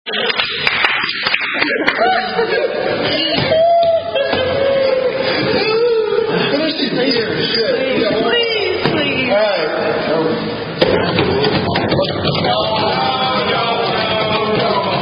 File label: Signs Indian Drinking Game; Inca Thumper II